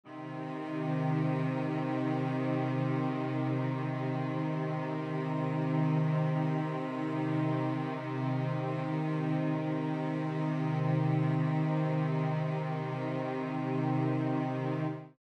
Flatted 3rd in C, String Ensemble

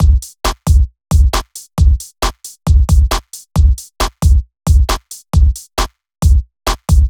Club Dance Beat

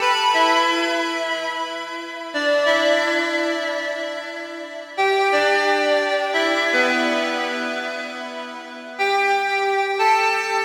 E Synth Lead; Drums- Downtempo Loops & Samples; RootOfUnity

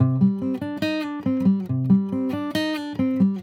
Acoustic Noodling

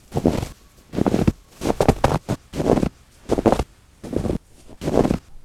Footfalls In Snow; footsteps

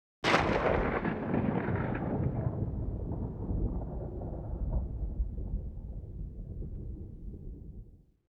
Thunder Clap; Single Clap, ambience

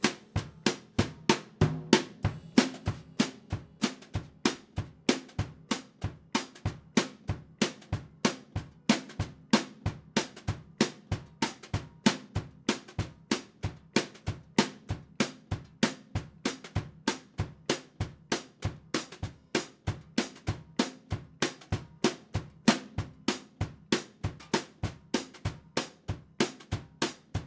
Drum Over Hi Hat

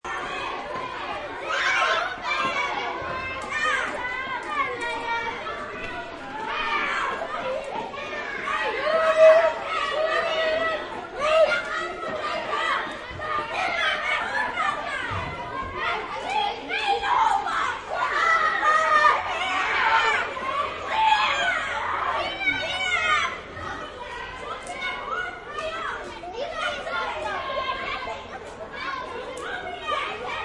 schoolyard

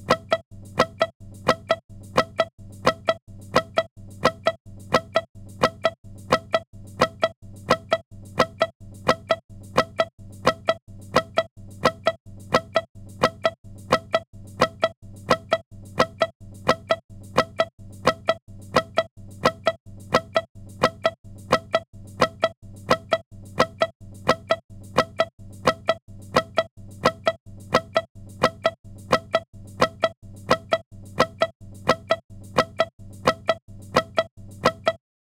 Guitar

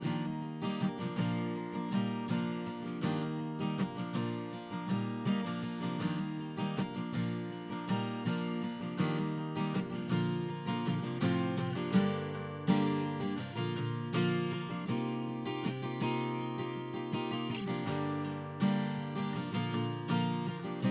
Acoustic Gtr Mic

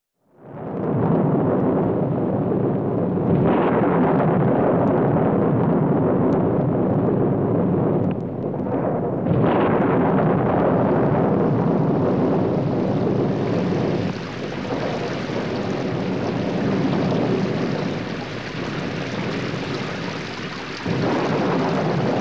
thunderstorm with rain

